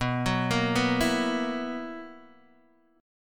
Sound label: B Major 7th Suspended 4th B down Guitar Open D